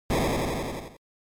General Noise Single

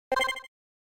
SNES Shooter

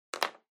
Felt Tip Pen Put Down

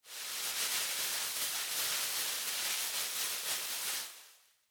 Throw Streamers Noise

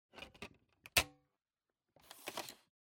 Optical Disk Drive Insert

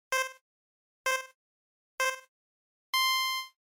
Racing Countdown

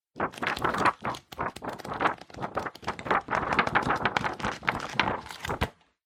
Book Flick Through

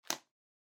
Bingo Card Punch

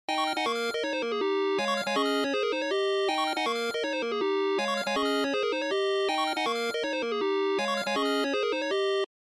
Bingo Machine Digital Shuffle Dry

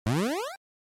NES General Pitch

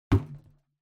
Balloon Slap

